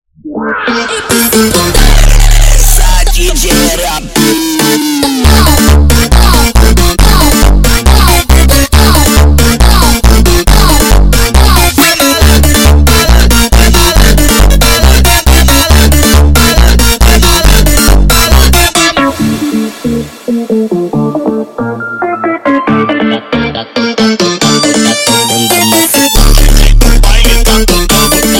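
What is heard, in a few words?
sped Up, phonk music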